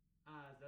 Piano

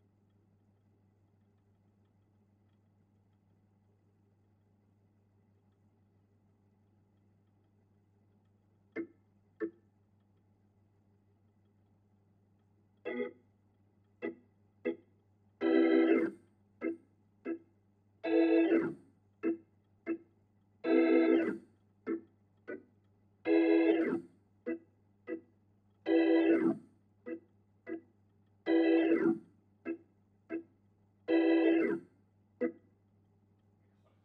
Hammond

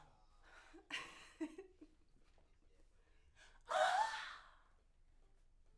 Backs; SCHOEPS

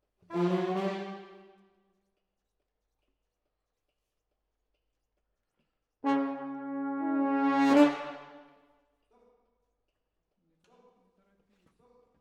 Horns Over

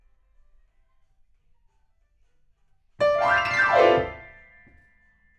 PIANO, RNR for piano

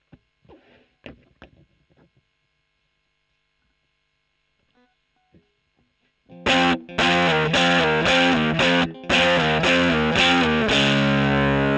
E.GTR Gefell

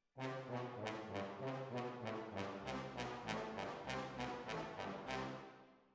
Hall

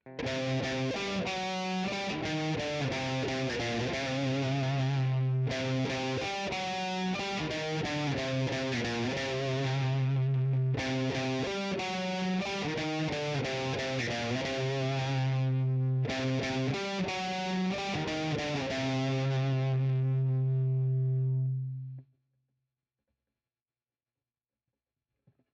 Guitar 2

